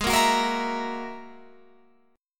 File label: Ab 7th Suspended 2nd Sharp 5th down Guitar Open F; Ab7sus2#5 strummed